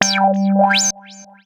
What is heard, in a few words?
Bonus; Blue Rider; PS4